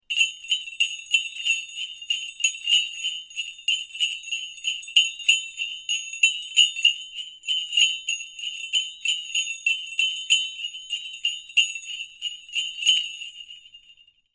tiếng chuông jingling bells giáng sinh

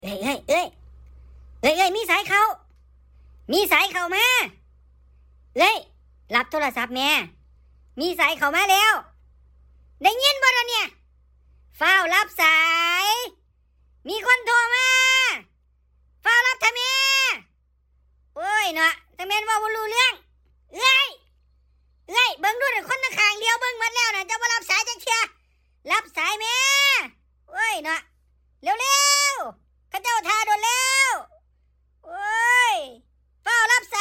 nhac chuong doc la nhat; เสียงริงโทน แปลก ๆ; เสียงเรียกเข้าแปลกๆ; iPhone และโทรศัพท์ Android

